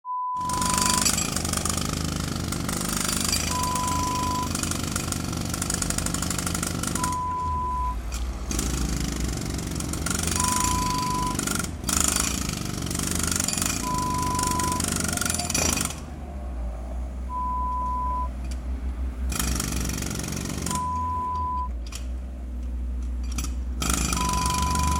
Road Work sounds

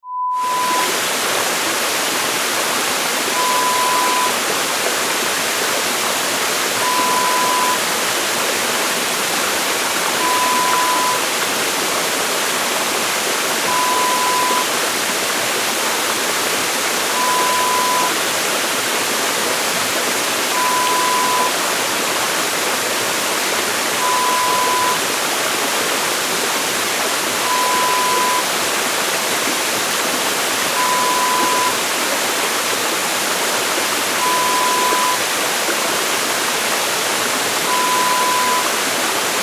Diamond Waterfall sound

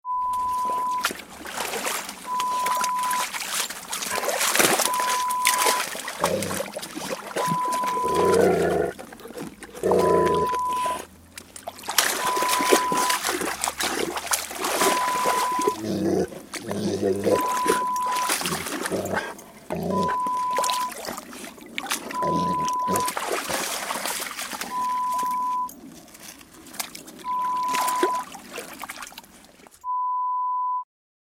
Dog splashing sound

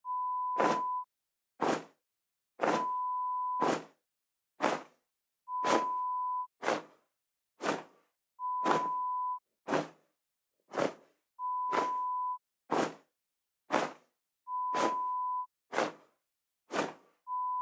Flapping wings

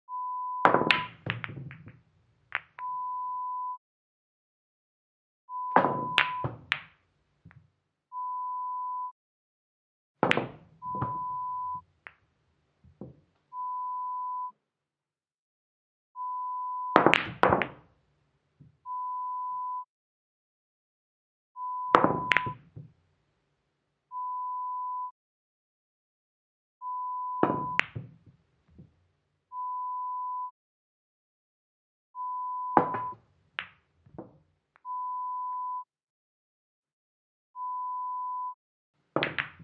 Pool Table

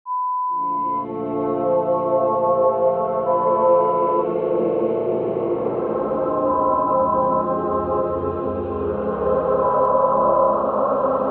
Creepy Atmosphere Horror Sound Effect

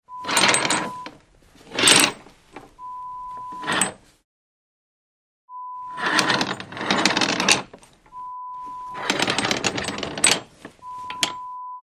Curtains

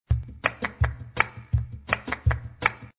TANGOS 164

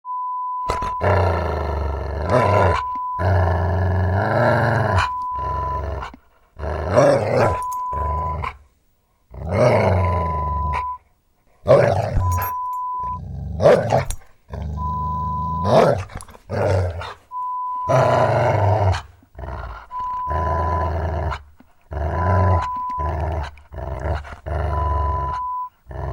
Dog growling sound

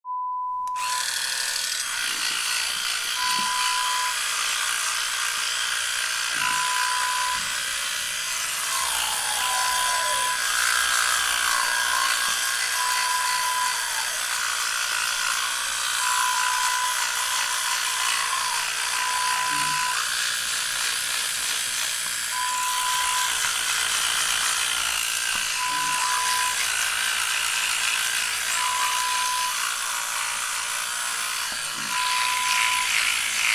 Electric Toothbrush sound

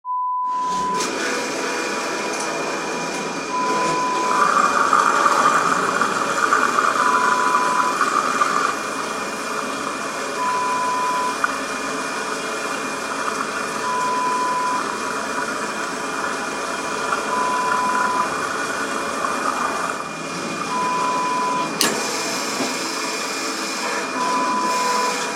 Gas Station

